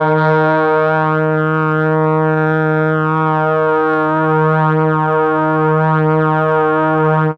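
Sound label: brs d sharp 2, Brass, MELLOTRON; Retro Sampler; Brass + Flute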